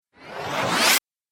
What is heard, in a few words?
Fast Forward Transition